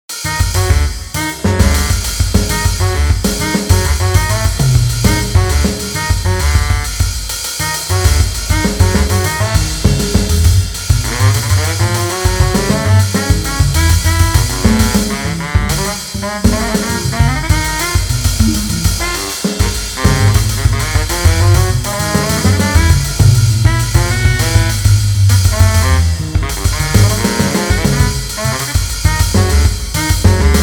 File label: Jazz Trio